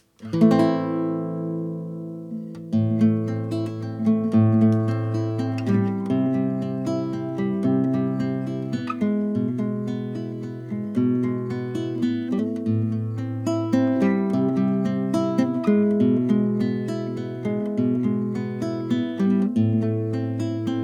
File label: guitar